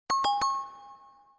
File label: play, huawei harmonyos